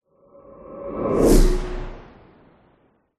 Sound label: whoosh element pass by machine vehicle; science fiction